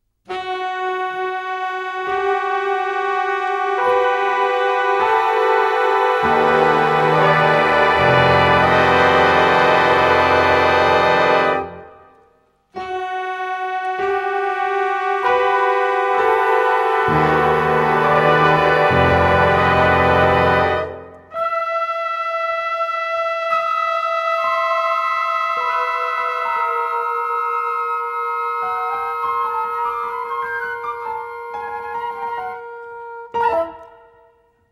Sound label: funk